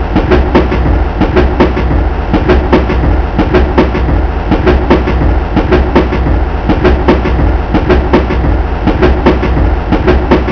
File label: rail clack